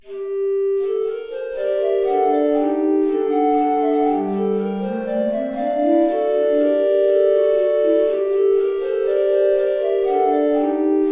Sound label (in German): 3 Blockflöten; Raggi dov' e'l mio bene